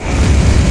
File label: Clicky Gadget Things